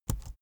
keyboard laptop enter press slow soft; kommunikation; computer

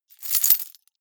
key ring large rattle air hard; objekte; schluessel

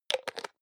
hygiene small cup close medium; haus-garten; badezimmer

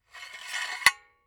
silicone gun empty pusher pull out slow; soundpool

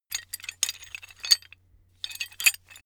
metal handling pieces

